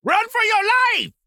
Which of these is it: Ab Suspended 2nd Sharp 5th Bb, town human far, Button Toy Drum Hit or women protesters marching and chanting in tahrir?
town human far